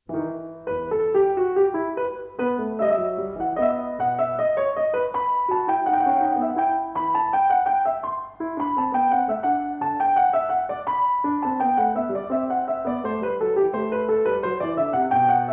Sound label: Invention In E M